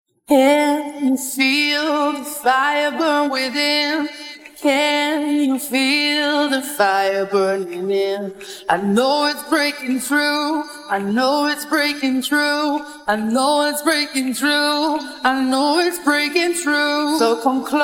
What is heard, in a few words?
vocal